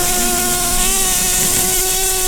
Lapidarius queens, bumblebees

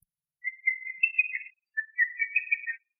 Lesser cuckoo

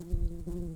Humilis workers, bumblebees

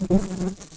Pascuorum workers, bumblebees

